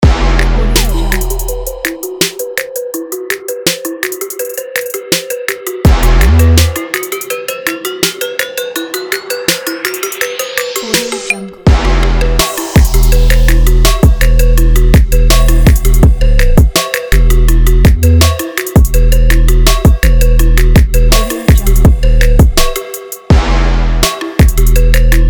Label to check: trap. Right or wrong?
Right